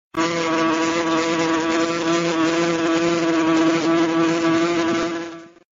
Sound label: wasp sound effect